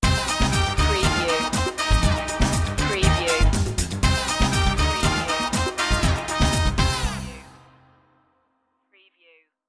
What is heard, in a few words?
latin express sting